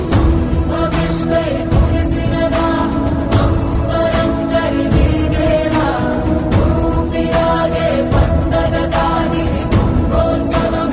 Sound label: Temple Ringtone